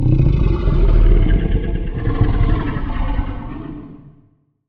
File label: Feline Quiet Short Roar; The Decline Of Warriors; Monster Sounds Volume II